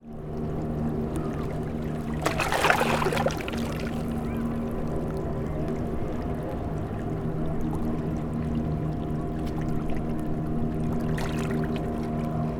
ambience harbor entrance small motor boats pass sail pull down passing boat small; waves; zapsplat